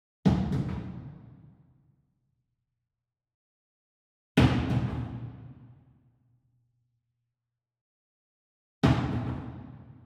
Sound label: Sweetener Wood Mallet Bounce Hit Far; boom library; Cinematic Strikes Construction Kit